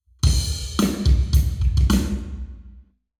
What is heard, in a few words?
Hall Drums 0 deg